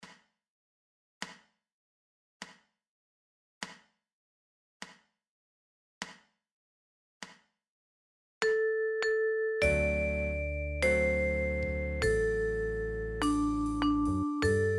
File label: Tin Whistle